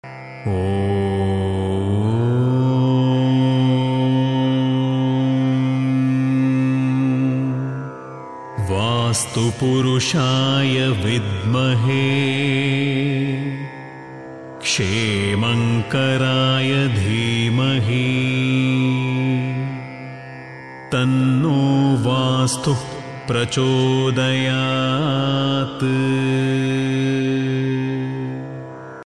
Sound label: vastu gayatri